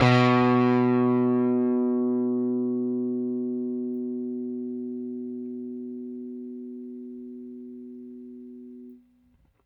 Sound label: Rickenbacker Bass; Airwindows